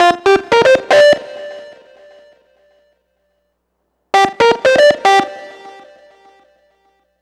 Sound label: Grunge Lead; Riffs & Solos; Dr. Fink's Funk Factory